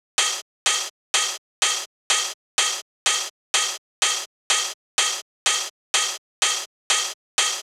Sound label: hat loop 125